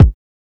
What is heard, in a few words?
old school reverb bass drum single shot A key; kick